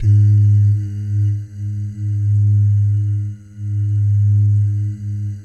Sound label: BS DOO, Vocal Planet Jazz FX MIX GUYGAL; Spectrasonics